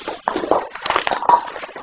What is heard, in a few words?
unknown noise and no response; School House in Michigan